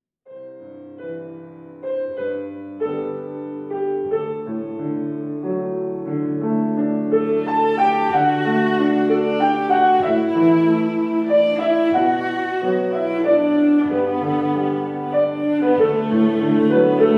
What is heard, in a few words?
viola mit klavier; trauermusik leipzig